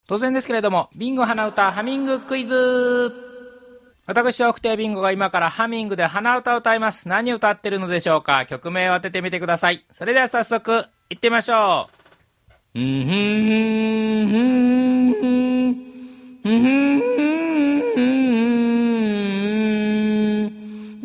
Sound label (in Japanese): hanauta